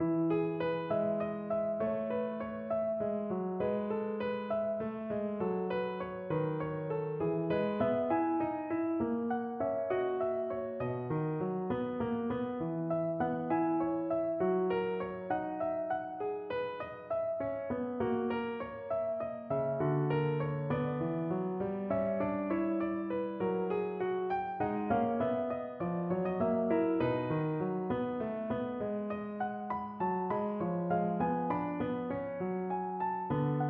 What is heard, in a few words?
prelude, piano